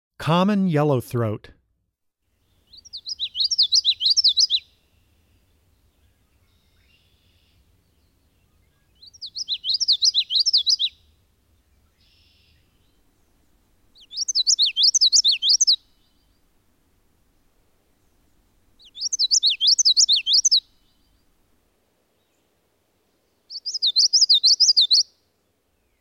Common Yellowthroat, Wildlife Sounds

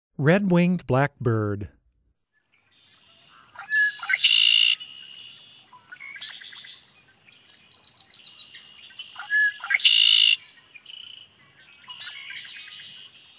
Red-Winged Blackbird, Wildlife Sounds

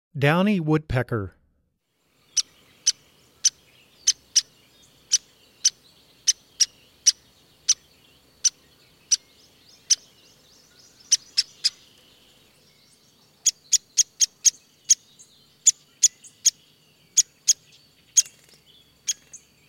Downy Woodpecker, Wildlife Sounds

